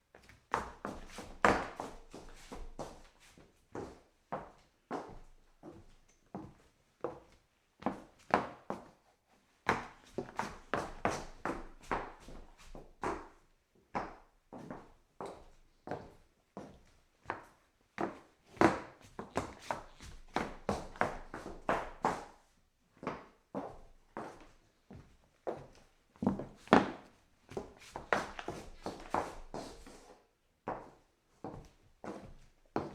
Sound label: shuffling high heels